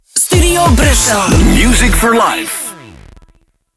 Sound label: Key Sweep E 91bpm; StuBru; Music For Life